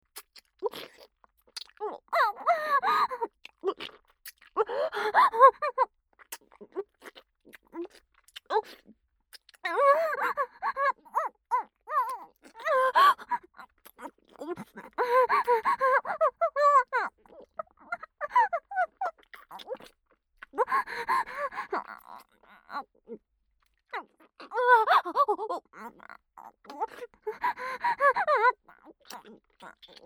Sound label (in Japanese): キス、激しい喘ぎ, ASMR